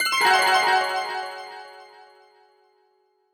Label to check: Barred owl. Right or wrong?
Wrong